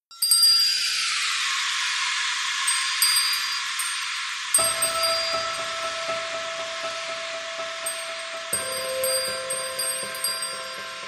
Bell

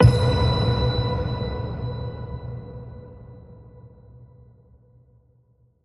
Nice Ending Ping

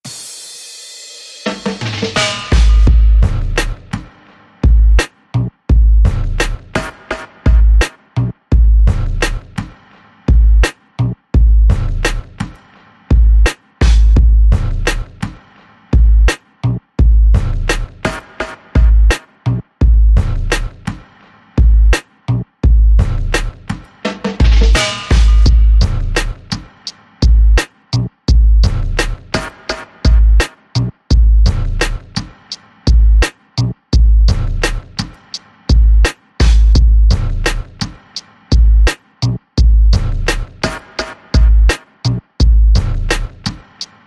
Beat

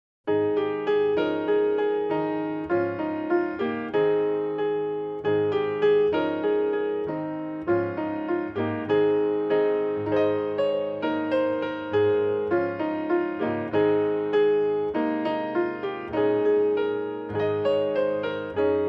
Good King Wenceslas, Solo Piano

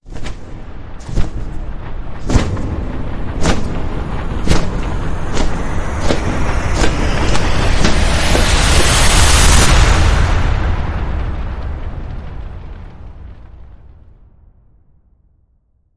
Phoenix Reborn Rise

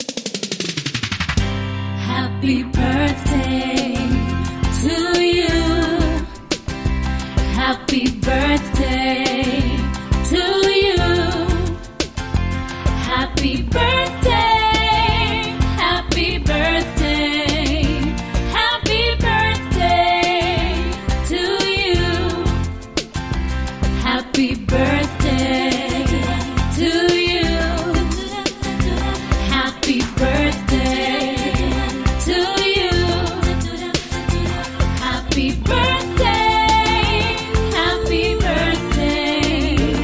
Female Vocal Pop Happy Birthday

